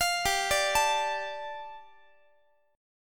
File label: G 7th Suspended 2nd, Guitar; arpeggiated; JGuitar